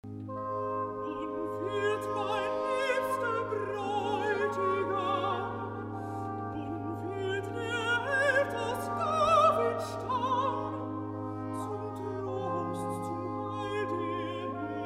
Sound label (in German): rezitativ alt; el dia de nadal segons bach